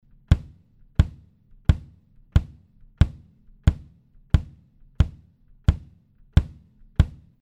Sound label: MOUSE Kick